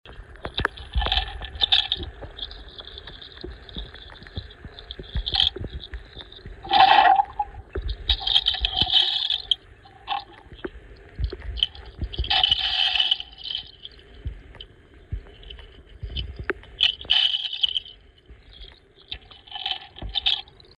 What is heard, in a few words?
hydrowave sound